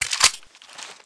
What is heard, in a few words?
DEagle clip out, weapons; cstrike